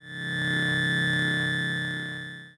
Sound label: Sci-Fi Electronic Alien Power Source; Science Fiction